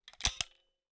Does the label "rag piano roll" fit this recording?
No